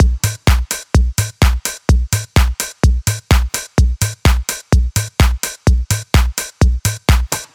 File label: 127 Bpm Deep Techno Groove